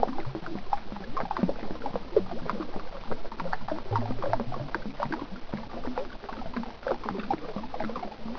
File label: lava